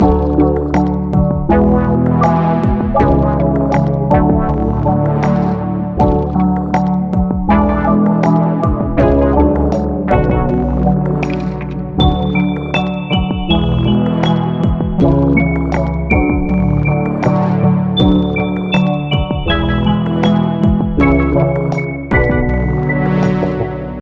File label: stem bridge; Pix the Cat